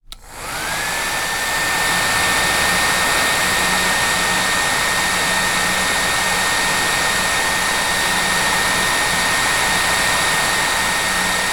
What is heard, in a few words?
Hairdryer Still